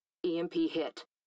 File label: emp hit; nfs rivals beta audio